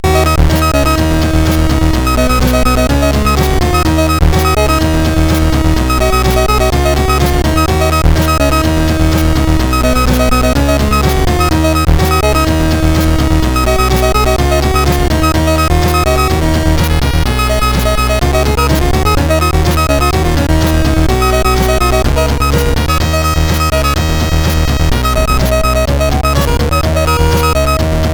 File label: sid CSG8580R5